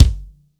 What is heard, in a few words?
kit, Yamaha Motif-ES8